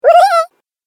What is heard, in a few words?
Cartoon Game Voice Wu-hu-eh-a